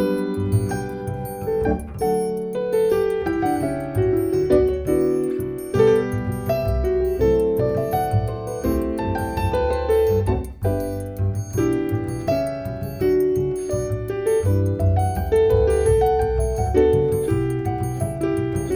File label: Variations, for keyboard